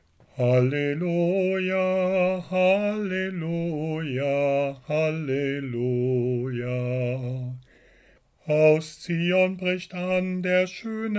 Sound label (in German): Halleluja